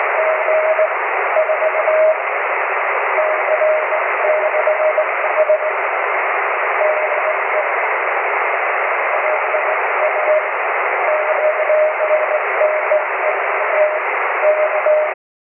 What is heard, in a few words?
cw; 23cm